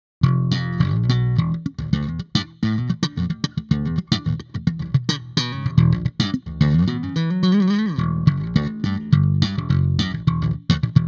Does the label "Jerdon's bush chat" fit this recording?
No